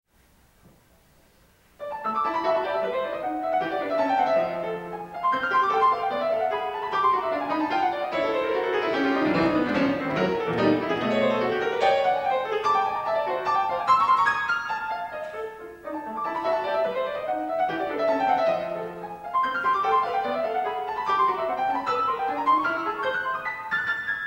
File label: Poems for Piano; Persichetti; Vol II No11 Make me drunken with deep red torrents of joy